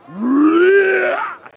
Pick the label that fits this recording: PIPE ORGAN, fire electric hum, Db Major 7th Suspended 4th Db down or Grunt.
Grunt